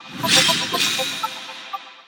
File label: lootbox sfx; discord; buster sword